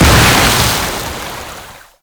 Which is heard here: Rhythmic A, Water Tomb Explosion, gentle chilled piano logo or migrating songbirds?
Water Tomb Explosion